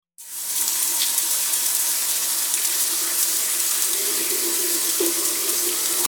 Shower

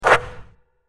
Basketball swish

